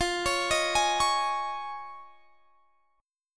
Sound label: F Minor 7th Sharp 5th; arpeggiated